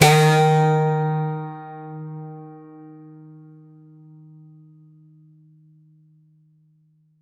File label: single hit loud snapped, stringed-instrument